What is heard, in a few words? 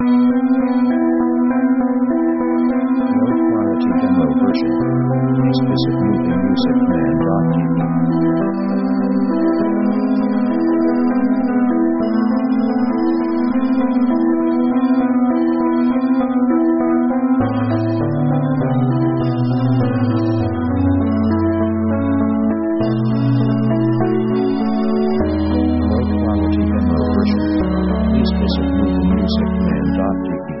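synth orchestra 70s modulation sketch; synth themes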